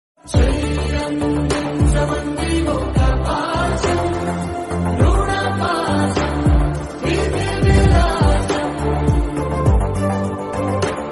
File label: emotional bgm emotional ringtone; bimbisara